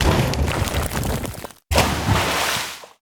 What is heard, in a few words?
cannon hit wall